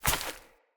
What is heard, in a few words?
Corrupted Sproutfolk Grunt Step Veg; Enemy; Footsteps